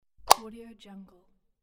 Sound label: switch; soundfoodsfx